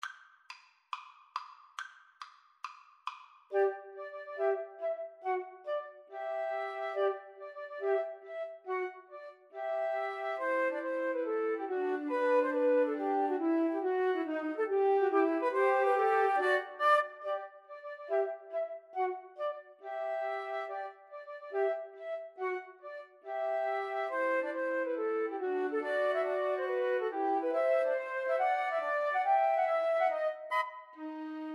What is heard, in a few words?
tchaikovsky nutcracker, 2 Flutes Clarinet